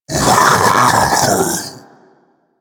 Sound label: Infested Splitter Split Vocal Alerted; Warframe; Grey Strain